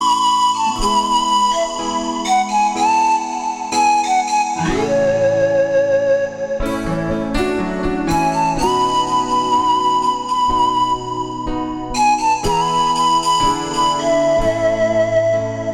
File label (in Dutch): Instrumentaal panfluit